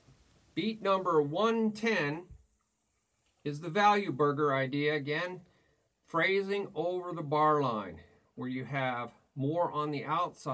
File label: Beat 1 a2 a3 a4 a Toom BaBaCha BaBaTi BaBaChoom BaBa